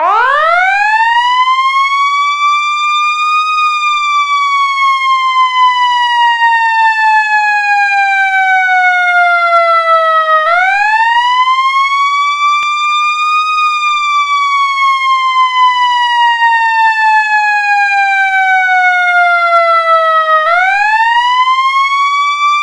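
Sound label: emergency vehicle wail, sirens; eq2b; gmod; sgmsirens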